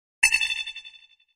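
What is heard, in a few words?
HF Electronic Ping Reverb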